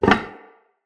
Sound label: Vase Medium Impact; Vases